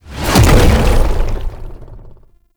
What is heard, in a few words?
Brimstone Land; Roathe; Warframe; Tau; Abilities